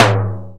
SDX ROTO TOM G; Greytsounds VOL-1; SDX TOMS

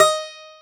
Domra D#4; Planet Earth; Digital Sound Factory Planet Earth

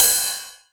909 Ride; Drums

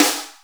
Snare, Akai MPC500

